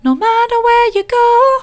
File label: soft, vocal